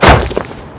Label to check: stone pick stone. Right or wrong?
Right